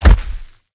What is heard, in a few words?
step, procoptodon; zoochosis pack1